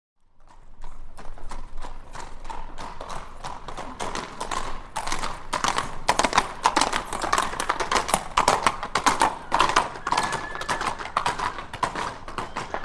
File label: Two Horses Gallop By On Cobble; Historical; Hollywood Edge Historical Series; War Horses Armies & Weapons